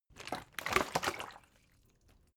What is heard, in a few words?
bottle water sparse upend slosh; berufe